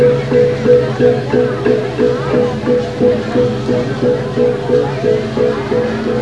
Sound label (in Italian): suonerie musica ritmica